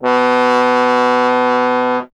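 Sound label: bass trombone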